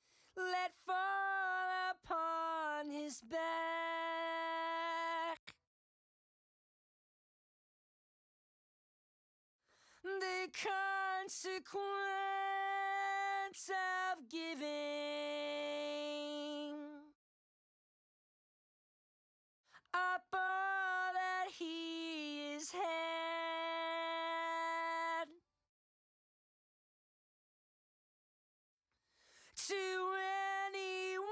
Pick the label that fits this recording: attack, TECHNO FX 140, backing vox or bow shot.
backing vox